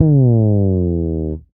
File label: slides, bass; Spectrasonics; Bass Legends CD 1